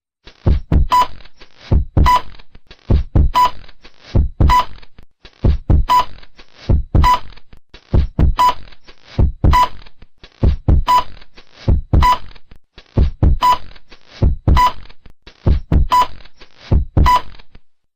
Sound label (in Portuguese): Som Batida do Coracao